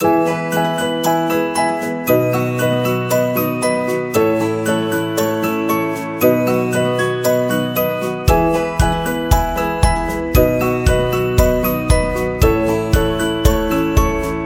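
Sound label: Happy Kids, Kids background music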